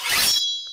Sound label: sword cut